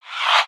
Ice Oneshot; Spinjitsu

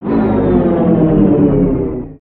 Siege Tower; Distant; destructibles

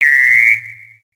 plains bird

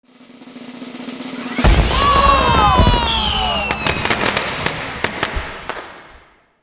Summary Complete, ui; aronwk collection; ndaudio

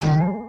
Upset Head Thud; emotes

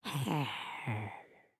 Sleep, creatures organic; Lego; Strombie

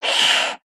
Pet Cat Angry; creatures pets; Lego

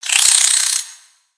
Spider Dazed; creatures mechanical; Lego

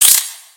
Spider Attack Melee; Lego; creatures mechanical

